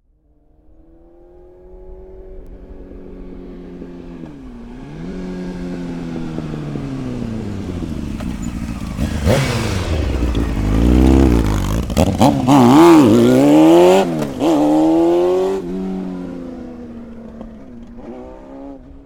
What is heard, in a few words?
transport motorcycle honda hornet race bike approach slow rev away; fork media